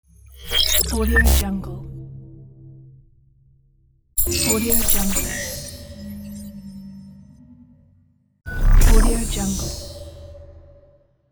intro glitch

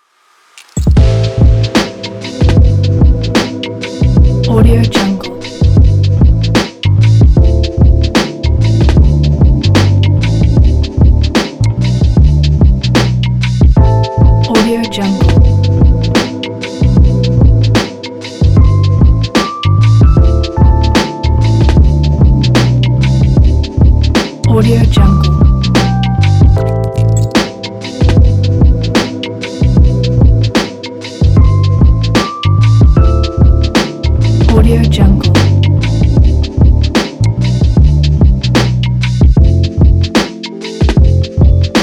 chill hip hop

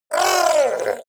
creature fishman shout pain

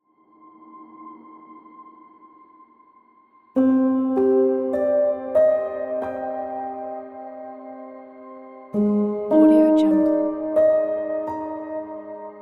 sad emotional piano soundscape

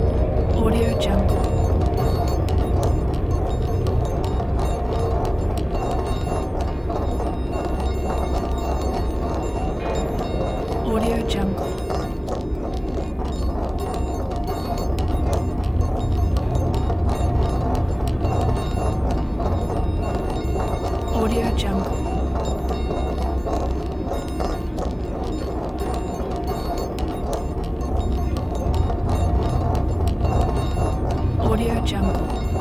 dark ambience

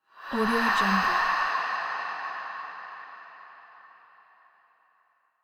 female sigh exhale

